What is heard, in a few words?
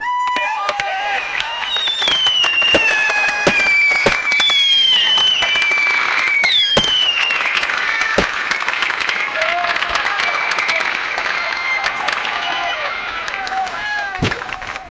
fireworks popping and ban; events